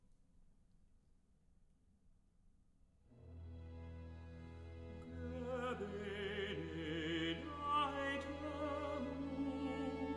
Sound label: Recitative; JS Bach Kantaten BWV 140 BWV 147; Gebenedeiter Mund